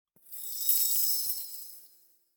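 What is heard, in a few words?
magic promising sound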